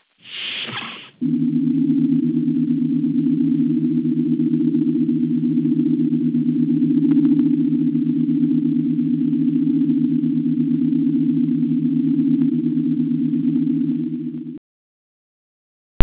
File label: doors; startrek